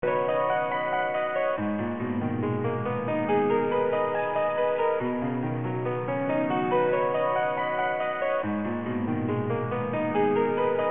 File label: gameover